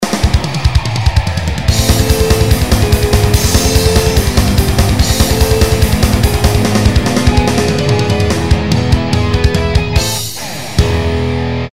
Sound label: Power Up